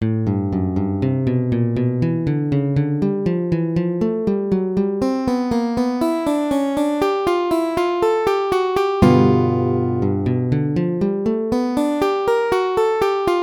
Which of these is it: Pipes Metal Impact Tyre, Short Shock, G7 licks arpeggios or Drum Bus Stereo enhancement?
G7 licks arpeggios